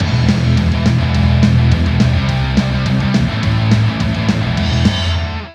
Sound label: Music heavy metal sting